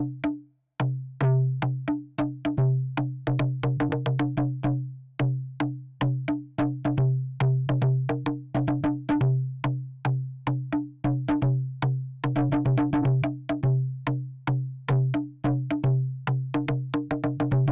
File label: Upbeat Perc